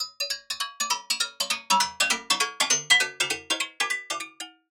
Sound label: Prism Percussion Loop